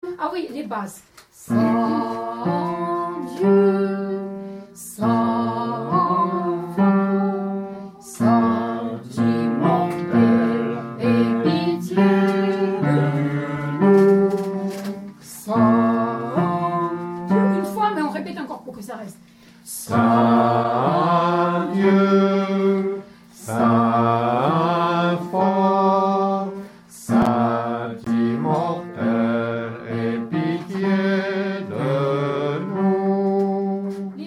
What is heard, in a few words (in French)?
basse; Saint Dieu